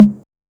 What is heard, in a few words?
Tom Hi, 606; classic machines